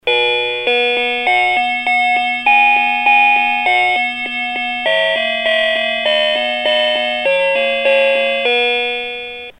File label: bootup